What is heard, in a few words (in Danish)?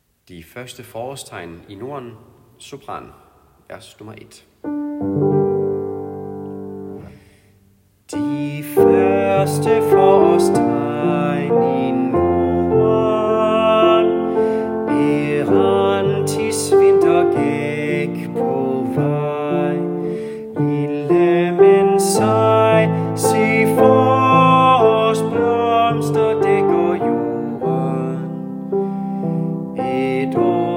sopran; Laudate kirkens folkekor